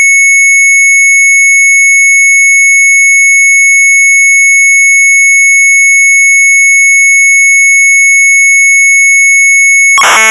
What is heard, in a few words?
MZ800 Tape; sharp; retromelsarchive